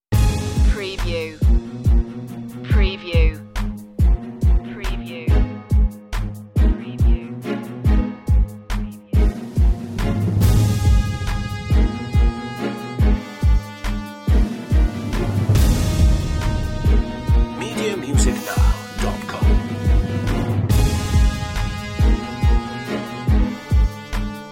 contemporary mix

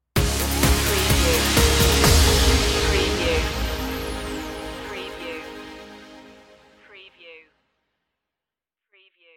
pass me by stinger

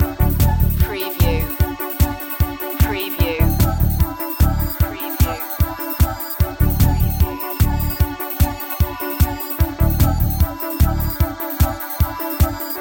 cool loop

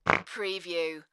Fast Fart

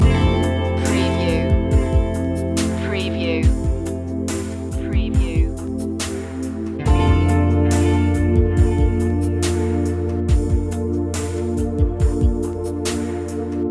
airy loop

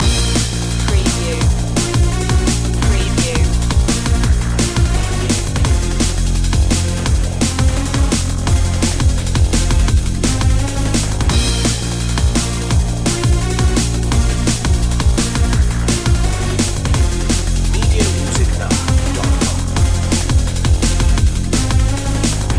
vital beats loop

